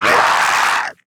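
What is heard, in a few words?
die, zombie male; nmr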